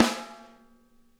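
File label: center, snare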